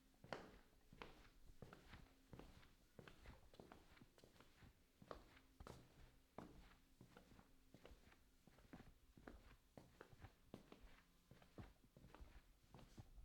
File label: Footsteps In A Hallway